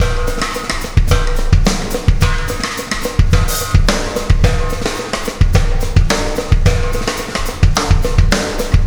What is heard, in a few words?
Wormhole Beat, Processed Drumkits